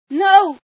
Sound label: pain, female sci